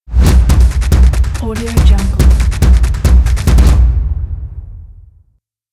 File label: energetic stomp logo; centralperkproduction